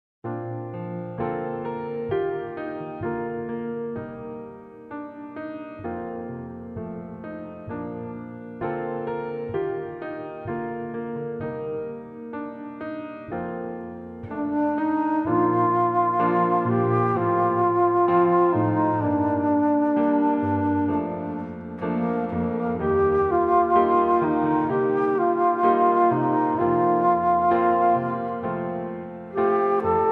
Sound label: acoustic praise, instrumental